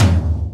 tom; drums; virtual drum sounds

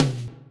tom; virtual drum machine

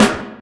snare 1; hip hop; virtual drum sounds

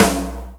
snare, drums; virtual drum sounds; custom drums

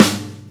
snare, drums; virtual drum sounds; custom drums

